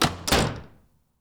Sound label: bang